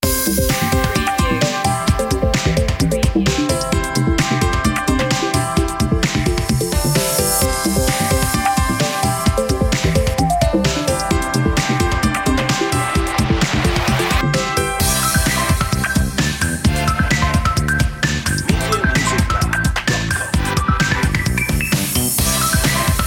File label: electro; media music now